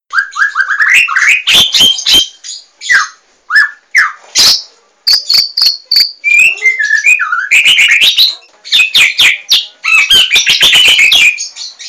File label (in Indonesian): murai batu; larwo